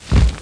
ray wing flap; Defiance

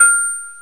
ding

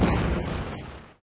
WARPED, EXPLOSIO; PaxImp

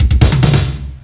start snd

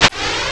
lighting match; Sound Effects for Multimedia - Volume 1

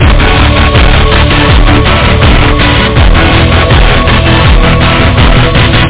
CHORD

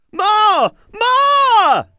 Hick; Deers Revenge

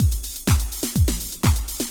DRUMLOOP HOUSE 125; eJay

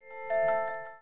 glass exclam; glass rose

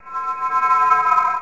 Noise; alone in the dark 4

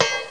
Hit-Lose; Professor Fernleaf from the Tropics

